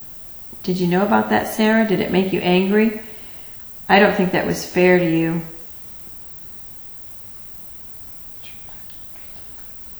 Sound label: Upstairs Hallway Whispering